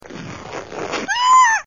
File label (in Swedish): Rrriiv Skrik